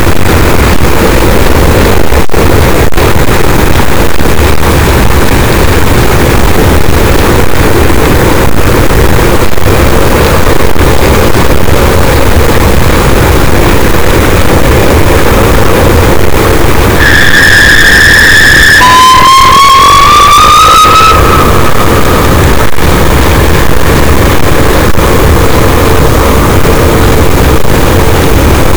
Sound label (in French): cachalot, gaussian noise; Physeter Macrocephalus